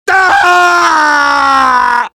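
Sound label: demoman pain critical death; svencoop addon; tfc; player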